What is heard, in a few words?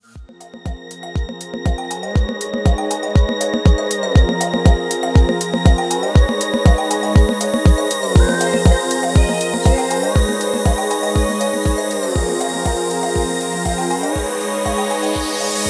Instrumental soundtrack of female singer